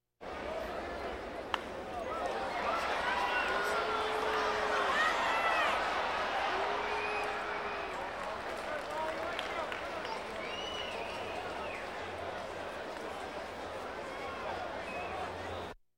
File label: Crowds Race cars Baseball